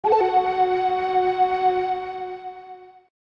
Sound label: Ninja Fanfare; Live-a-Live